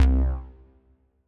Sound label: DEEP HOUSE BASS